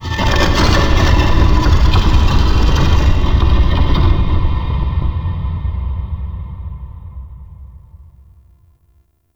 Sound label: Twisted Stone; Warframe; Man In The Wall